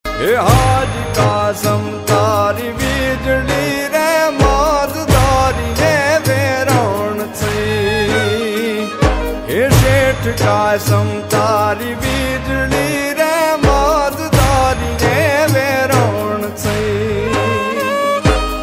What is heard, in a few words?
gujarati ringtone